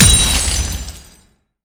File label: gold acquisition; LoL